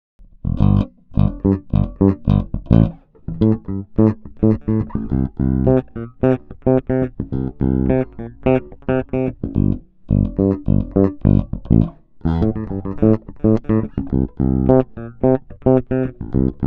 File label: G&L L1505 fingerstyle funk